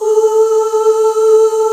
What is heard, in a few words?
MIDDLE OOHS